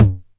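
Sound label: 909 high tom tape; Goldbaby Tape808 & Tape909; The Tape 909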